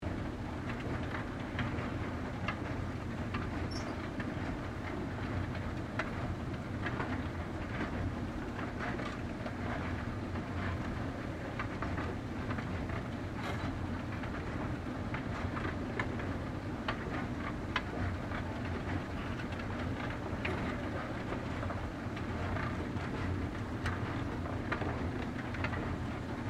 Escalator Run Loop, Industry, Escalator, Run, Loop; Escalators (Sound Effects:Machines)